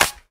Clap G Key, Royality free clap sound clip tuned to the G note. Loudest frequency: 3959Hz; percussion; single hits one shots